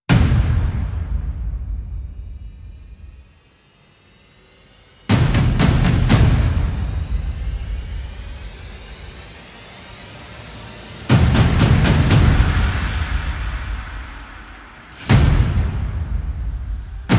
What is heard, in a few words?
Percussion and Effects